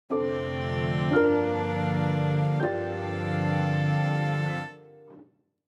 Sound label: Chill modern logo intro